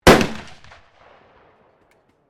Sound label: RIFLE 7.62 HUNTING FIRING